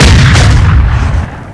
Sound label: fire